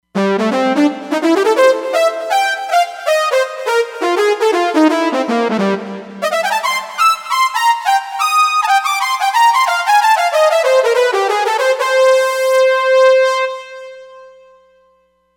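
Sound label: Wind Synth Lead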